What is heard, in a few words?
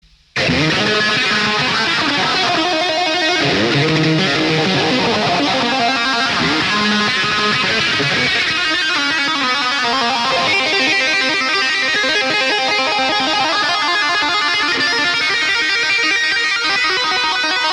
music